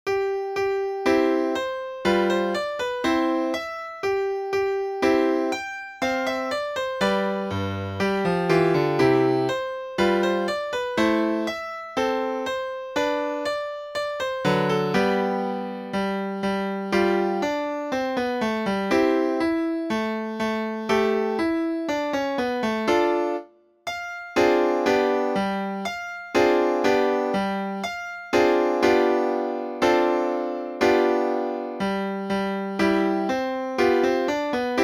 Gavotte, Klavier

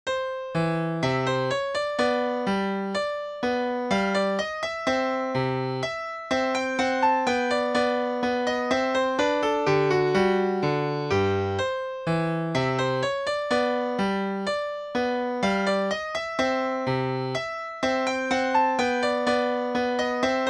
Minuet

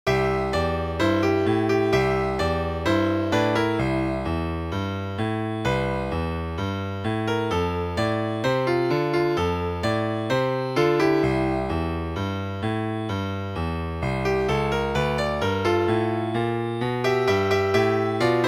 Blues in C

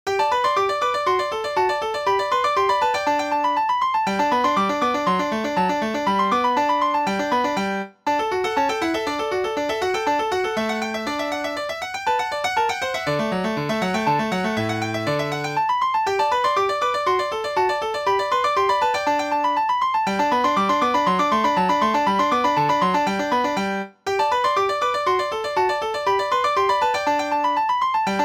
Rondo

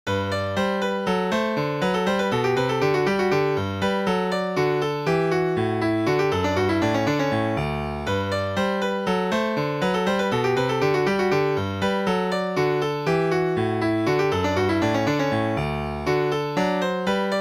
Allegretto Scherzando

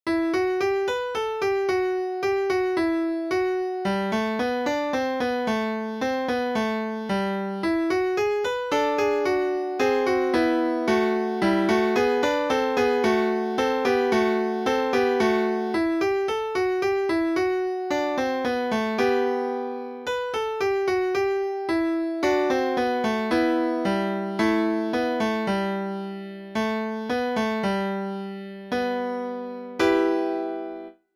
Piano

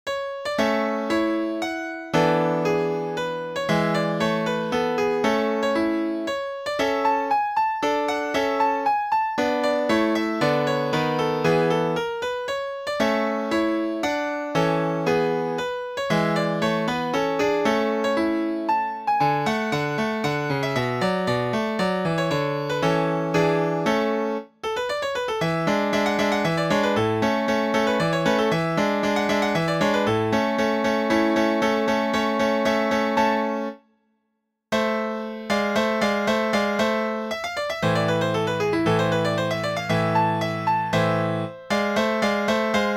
Minuet

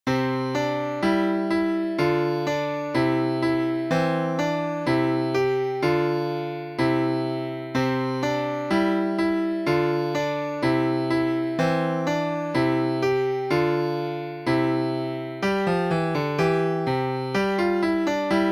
Old German Dance, piano

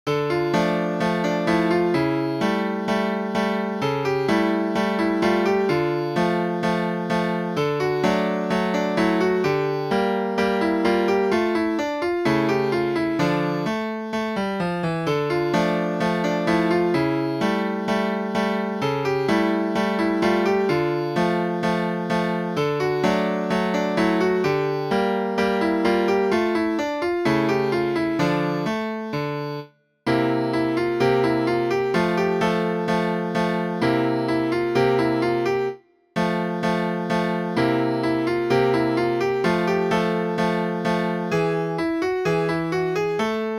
Gypsy Tango

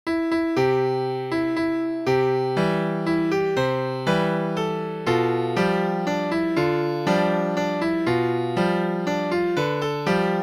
Lullaby

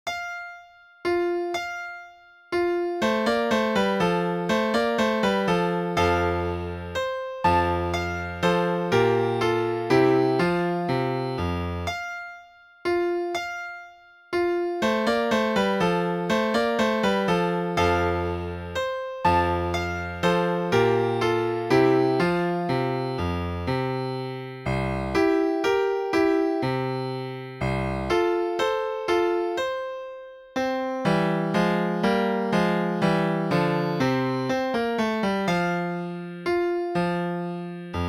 Country Dance

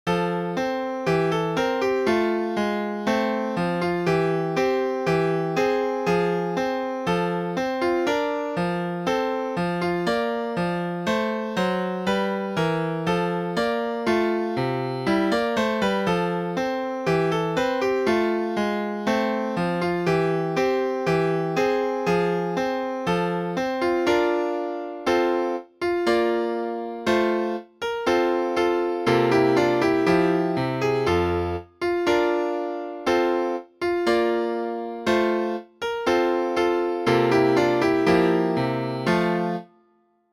Piano Concerto

